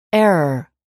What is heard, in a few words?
error